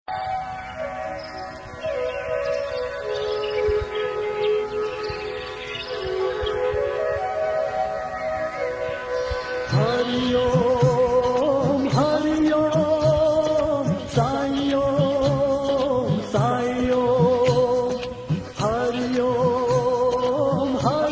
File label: hari om sai om ringtone; saibaba; Devotional Ringtones